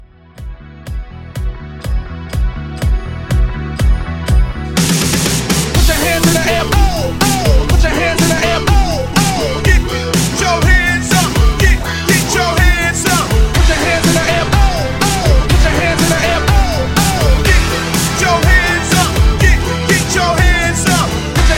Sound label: 80s Rock Hype